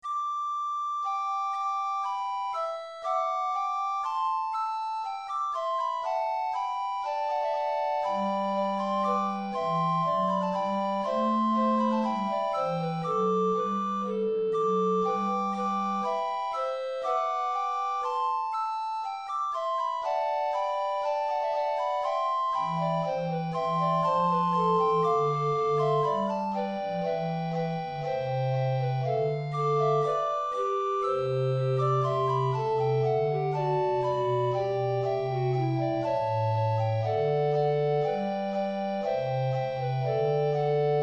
recorder quartet

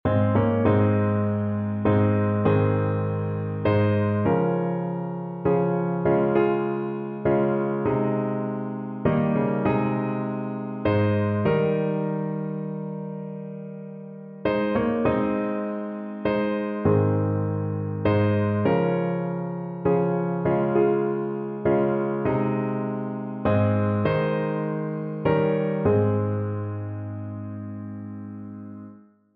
piano

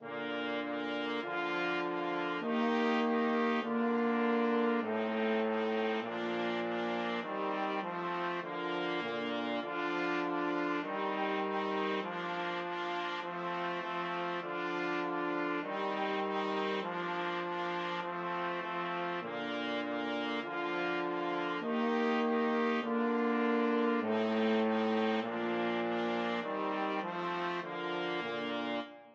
twinkle twinkle BRASS, brass quartet

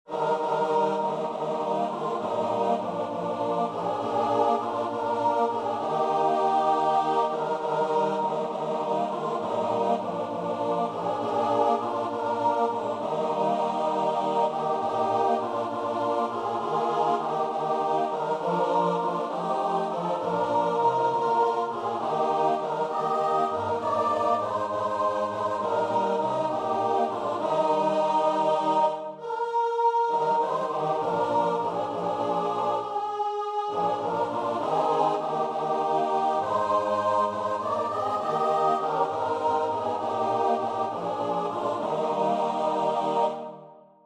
choir

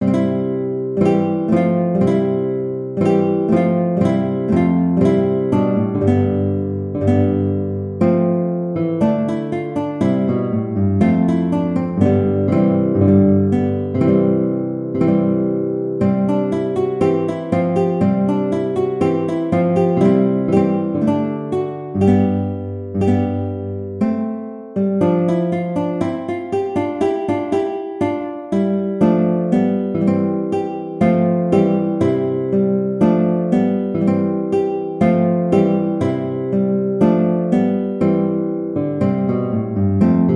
Pavana, guitar

